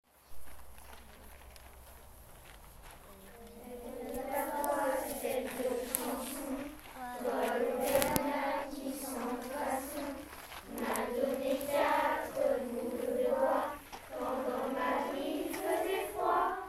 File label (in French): CHANT JEUDI SANS CARTABLE